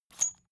salt mill squeak hard

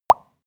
pop bubbles; soundpool

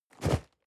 cardboard box medium shake single hard short; objekte

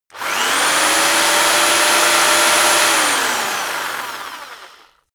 rock drill rev medium very long; maschinen; bohrer

